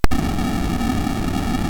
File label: MOS6581R3, GAMES; Slinky